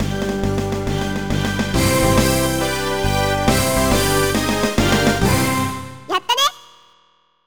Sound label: All Stage Clear; tokimeki memorial forever with you; saturn